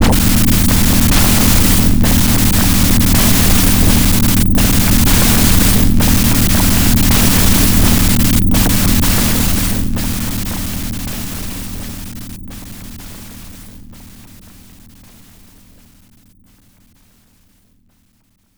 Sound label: Guardian Explodes; ultimate qix volfied genesis